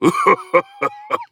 Laugh; Atlas; Paladins

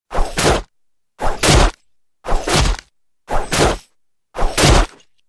Lifestealer attack

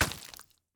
Drowned step; minecraft

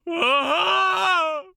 Falling; Paladins; Khan

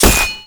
sword impact; Dota 2

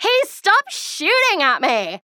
Taking Damage; Huntress

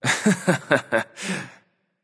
laugh; Neon Rebellion Rama

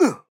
Grunt Jump; DarkLord; Paladins

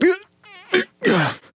Death Grunt; MalDamba

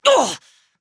Grunt Hit; paladins; Koga

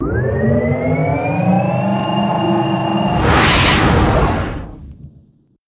Teleport; Dota 2; Mecha Boots of Travel Mk III

